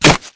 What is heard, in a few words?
Knife Shell, Damage